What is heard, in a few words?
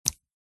foley footstep single barefoot walking wet concrete swiming pool edge